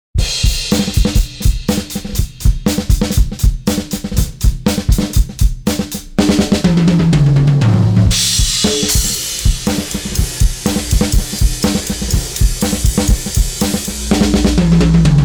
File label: drums Clean 60s